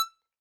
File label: Hohner Super64 Vib rel E5, Harmonica; Aerophones; Releases; Free Aerophones